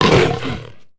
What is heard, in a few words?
tukata hit; Star Wars Knights Of The Old Republic I; XBOX